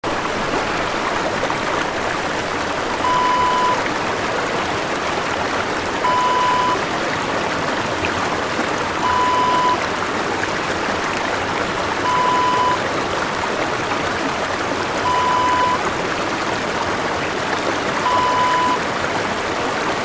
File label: river